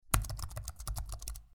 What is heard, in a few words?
type laptop